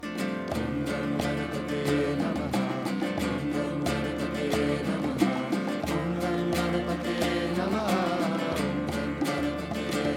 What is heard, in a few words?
Sanskrit Medley